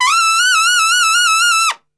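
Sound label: E trumpet shake; Best Service; Brass Super Section; TRP SHAKE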